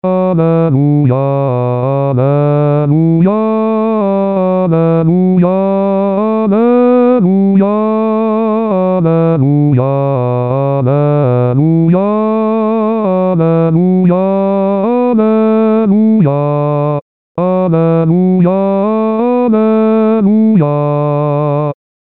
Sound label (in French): Alleluia, Chorale; St Victorien; Paques